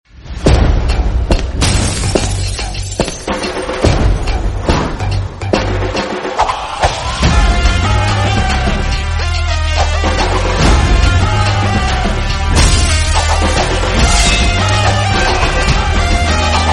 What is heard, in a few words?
theme instrumental; darbar; rajini kanth